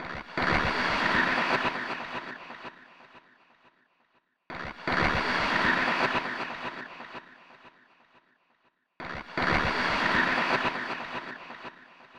Shortwave Radio South Africa; Gregory Kramer; Shortwave Radio South Africa IV; Wave Farm

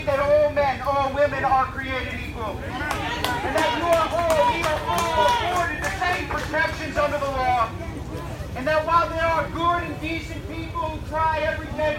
Protest; Marc Molinaro; Poughkeepsie

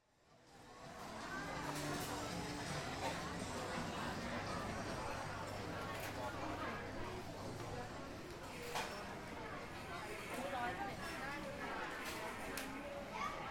Bangkok Noise; Paulus Van Horne; Wave Farm

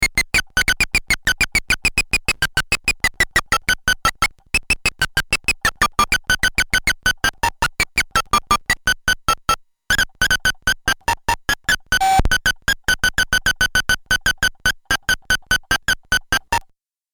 Beep Beep

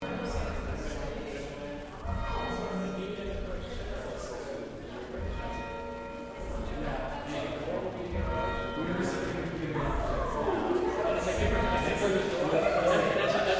audience churchbell

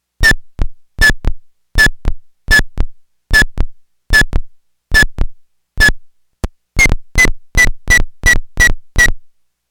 Simple Beep

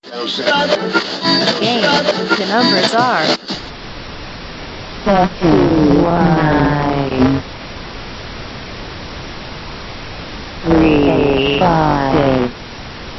Hellschreiber Short Waves Long Distance; Acoustic Mirror; Wave Farm

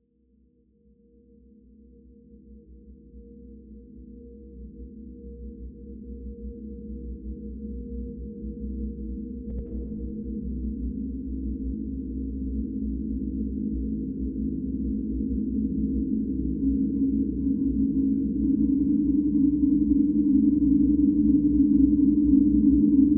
Modulation, Short Waves, Long Distance; Javier Suarez Quiros; Wave Farm

